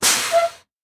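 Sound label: school bus brakes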